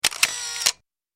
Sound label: camera